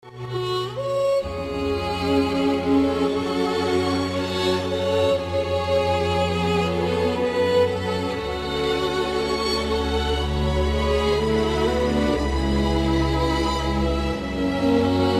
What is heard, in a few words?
BPM 158 INTRO